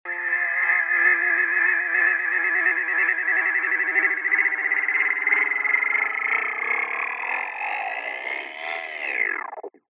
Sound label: lofi hip hop beats